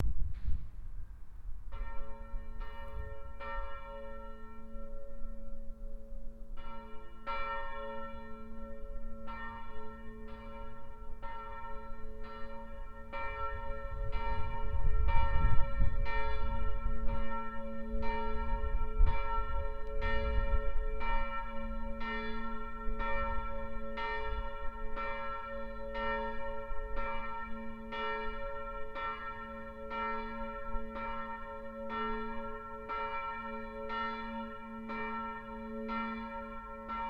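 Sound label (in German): erlöserglocke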